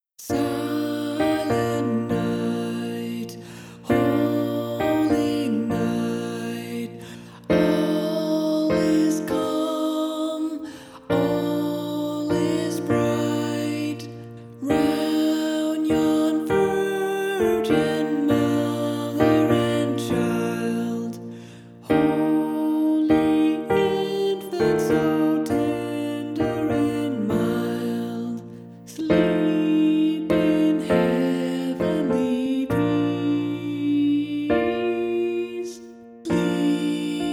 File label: Silent Night Alto; christmas choir rehearsal tracks